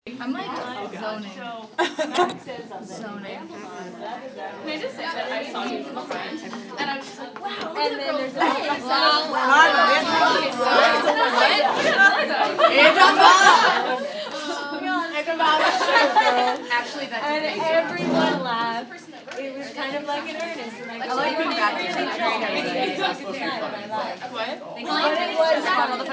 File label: Costume History class; Costume History class in the 10 minutes before the actual class starts. Students are talking or coming in to sit down.